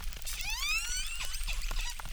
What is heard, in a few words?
Chirp zap, acquarium